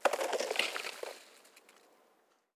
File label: ambient; World of Tanks